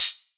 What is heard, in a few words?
hihat lil dirt, Closed Hats